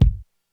Kick, Korg DSS-1 Drums

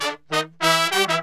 BRASS SAMBA

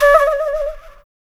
Flute Effect, effected flute; Sonic Foundry (Sony Creative Software); Jazz Solos and Sections

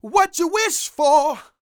SING, Vocal Planet R&B & Dance; ILIO

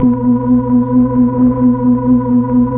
keys Optigan; Roland LCDP09 Keys of the 60s and 70s 1

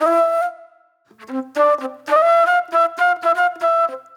Flute, Disco

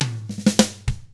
ROCK, Drums

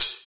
Hhat, HiHat; Equipped Music; Loungin House

